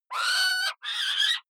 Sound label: owl shriek pair; natur tiere; voegel